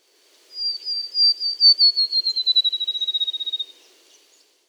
Field Sparrow Song

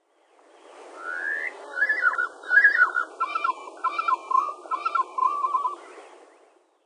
lower half Speed

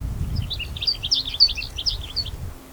bellii; Castaic

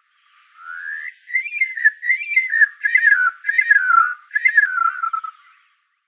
upper half Speed

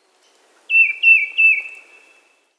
Tufted Titmouse Song couplet series typical

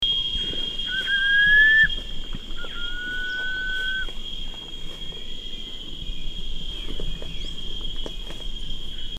striolated puffbird

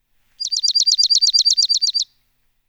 Dark-eyed Junco Slate-colored Song

